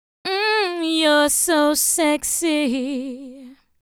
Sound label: VOICE GRL HOUSE 125; Windows shut down - Female voice tells you what she thinks of you!